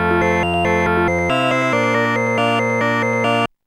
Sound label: rock eight; Instant Music